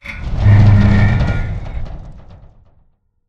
crane move stop metal stress; Titanfall 2

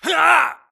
grunt death quick; Titanfall 2

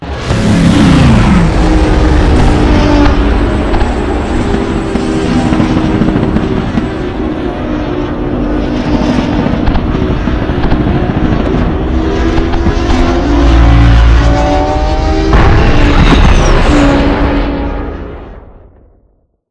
fly in 3 ships; Beacon; Titanfall 2

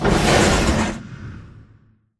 sliding door ext verb open slide; Titanfall 2

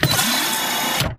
Mvmt Servo Head pivot Long; AI Stalker; Titanfall 2

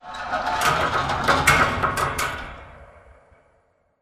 metal stress; Titanfall 2

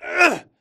crawl diag grunt; Titanfall 2

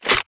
reload mag insert; wpn r101; Titanfall 2

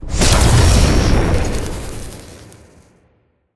dish activate; Titanfall 2

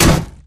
bullet heavyballistic titan; Titanfall 2

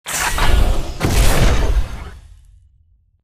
mortar stand 2 knee; Titanfall 2

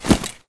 Grunt Mvmt FOL Loud Short; Titanfall 2

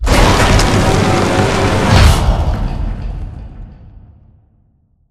Side House Raise; Boomtown; Titanfall 2

